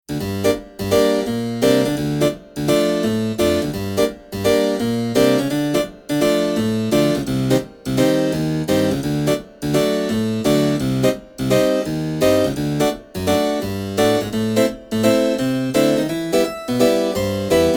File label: Harpsichord